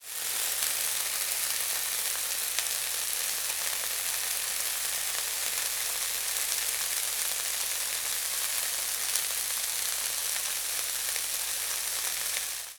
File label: Frying Pan Sizzling